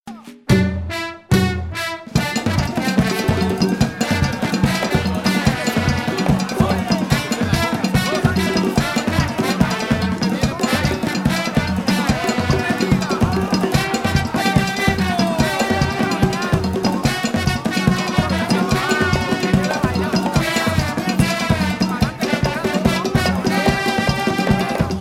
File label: samba brasilera